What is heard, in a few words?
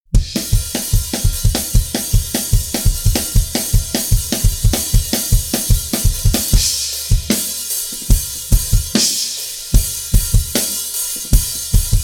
Punkrock-Beats 4; Schwarzes Album; Bum Bum Tschack Grooves Fills